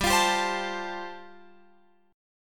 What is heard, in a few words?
G 7th Suspended 2nd Suspended 4th, Guitar Open F; G7sus2sus4 strummed